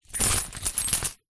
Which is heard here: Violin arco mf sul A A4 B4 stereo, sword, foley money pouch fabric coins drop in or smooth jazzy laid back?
foley money pouch fabric coins drop in